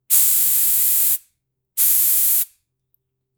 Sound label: high end perfume bottle